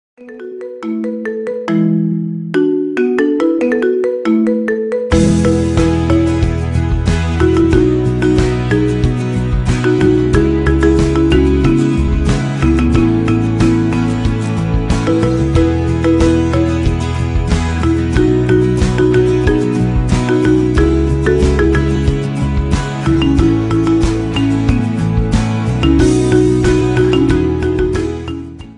marimba ringtone remix

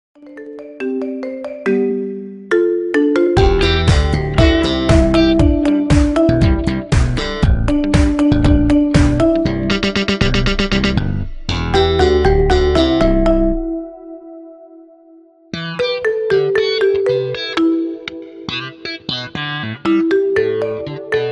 marimba ringtone remix

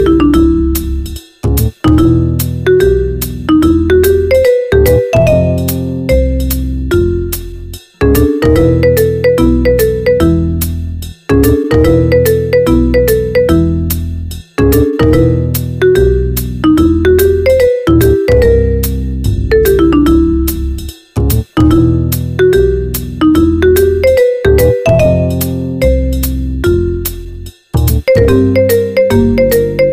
marimba remix; pink panther

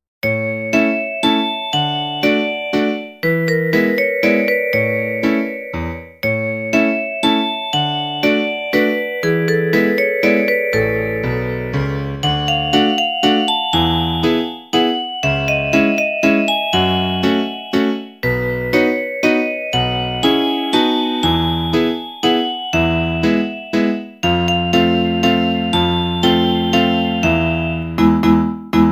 music box

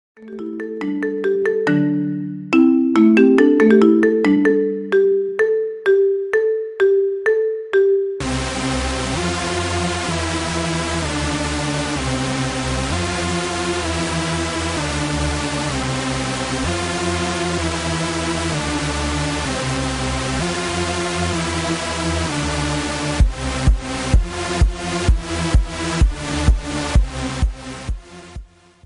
marimba ringtone remix

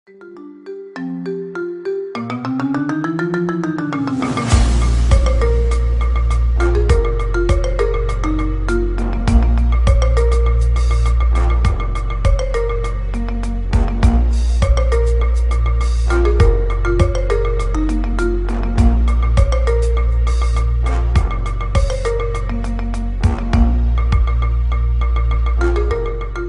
marimba ringtone remix

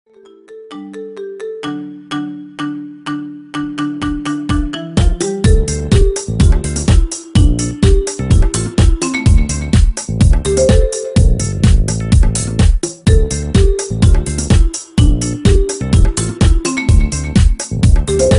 marimba remix

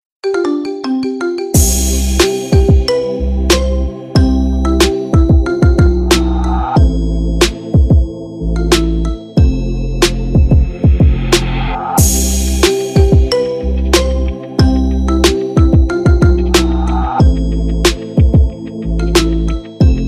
marimba remix

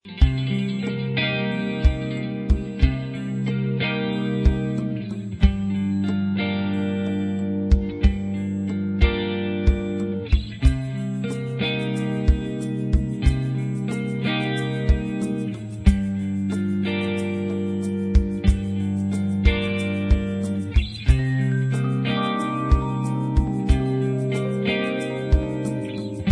romantic

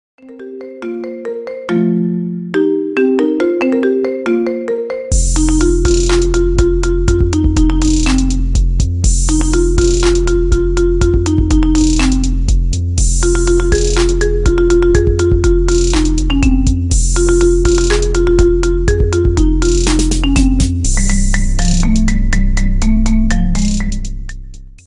marimba ringtone remix

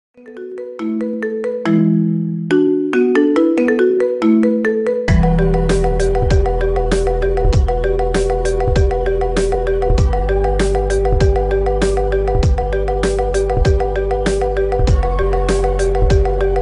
marimba ringtone remix

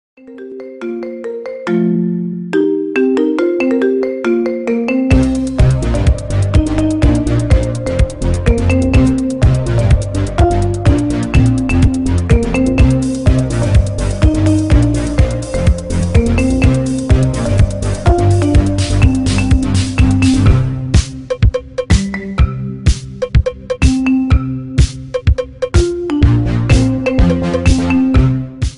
marimba ringtone remix

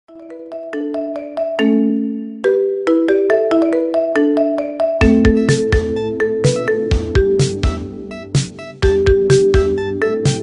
marimba ringtone remix

